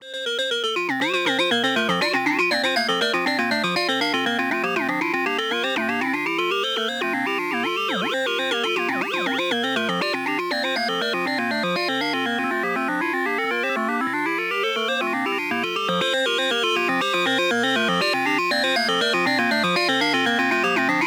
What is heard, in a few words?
Modular, ringtones; Motorola Moto-E5-Play